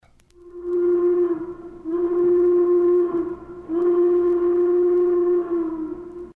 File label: Star Brass Long Bell Single Note Freight